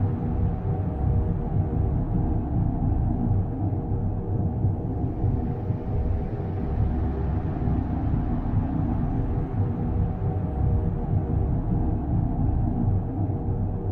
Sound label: ship colossus idle; Stellaris; machineage; ships